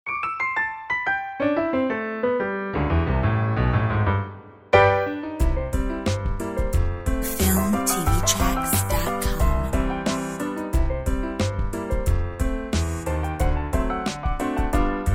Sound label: Hip hop